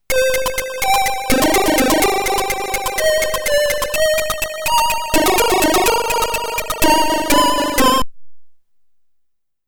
Scene Cleared AY; Operation Wolf; ZX Spectrum

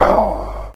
Zombie

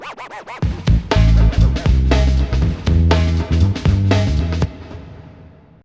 Ditty

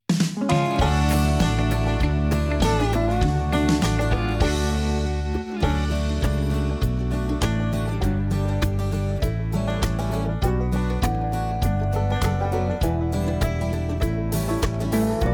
Radio Music; Jurassic World Evolution 2; PS4; Windows; Xbox One; Xbox Series X/S

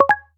Cam Stop; android 11 ui sounds 2020

